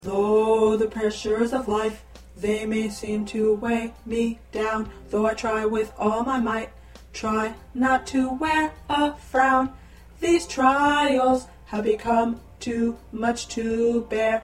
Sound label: Tenor, choir